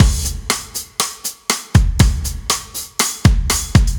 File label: Heavy, rock